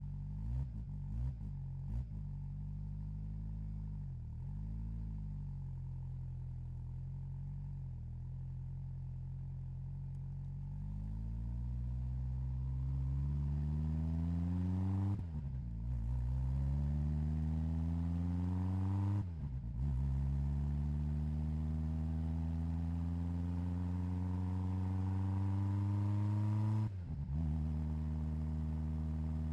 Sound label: Peugeot 205 - ONBRD - MEDIUM - Drive Various - EXHAUST; Pole Position; DPA4062